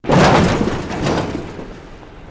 Vehicle Suspension, hits & rattles

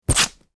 Bullet Tears Vest

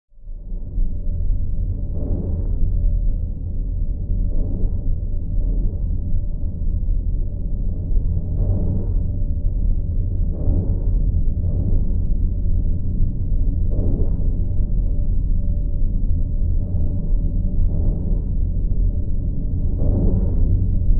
Bed Alien Womb Throbb

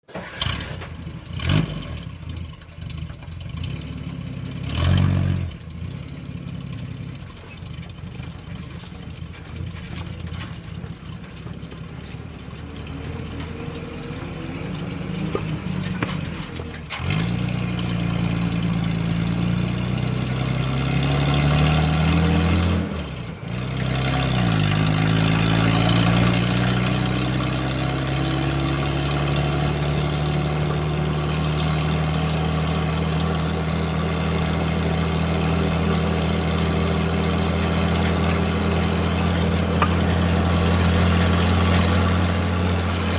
1949 Dodge 3/4 Ton Pick up

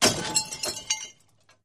Auto Crash Sml Head, car crashes

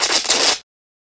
Elect Arc Sparking, arcs & sparks

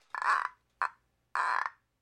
Robot Body Movements, cartoon fx

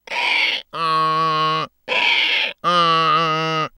Donkey Human Hee Haw

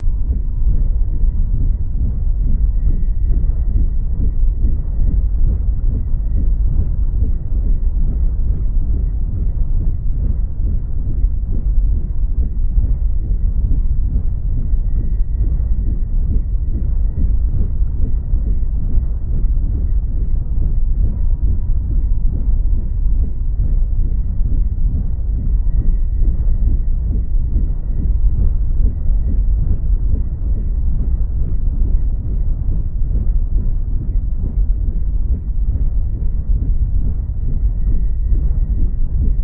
Propeller Movement Underwater

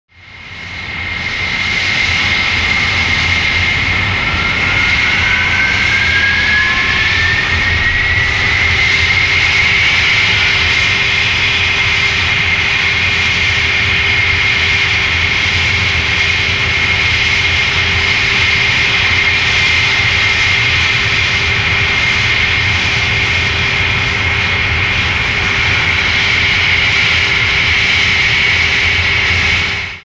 Jet Engine Idle Concorde

